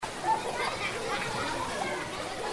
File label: children playing